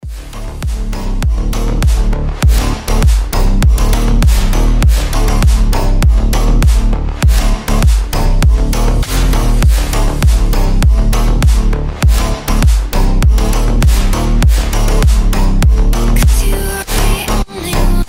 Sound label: rington hard sound